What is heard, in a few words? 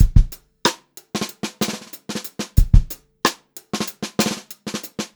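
groovy variation, Funk-Groove Drumloops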